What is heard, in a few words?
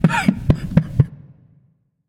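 non-verbal boohoo; smite; atlas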